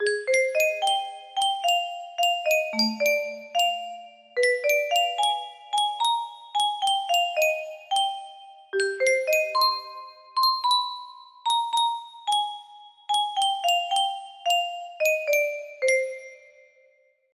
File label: melody, music box; sailor moon